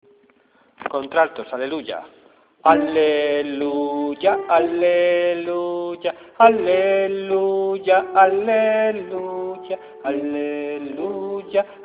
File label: altos; aleluia